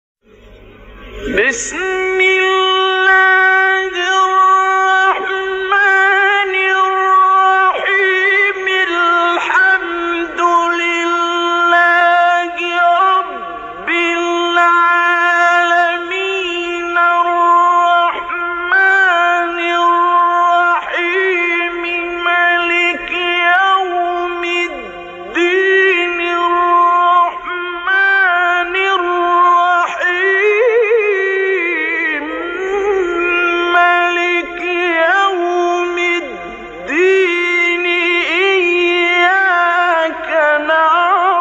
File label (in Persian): تلاوت سوره مبارکه حمد; عبدالباسط